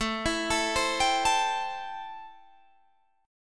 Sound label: A-Minor 7th A; 17,19,19,17,20,17; arpeggiated; Am7